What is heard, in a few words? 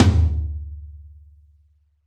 Real Tom alt, Roland BK-5